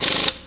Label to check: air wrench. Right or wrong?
Right